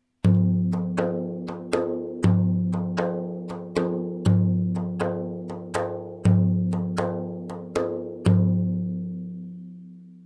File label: tar